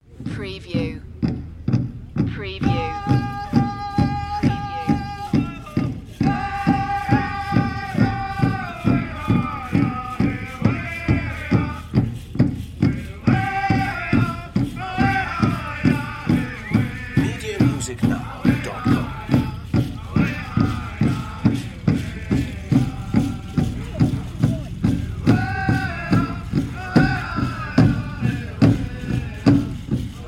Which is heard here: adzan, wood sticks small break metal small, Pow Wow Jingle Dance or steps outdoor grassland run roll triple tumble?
Pow Wow Jingle Dance